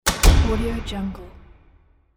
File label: creepy wooden door close